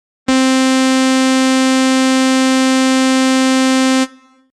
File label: Saw Tooth